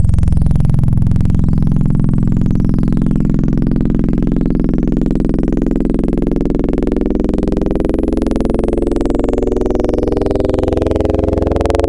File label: Log, Wavetables; Cymatics; Wavetables Collection Vol 3; Serum